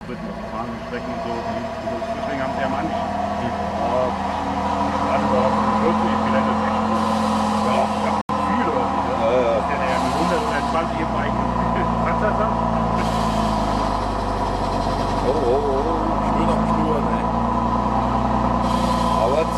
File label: Tatra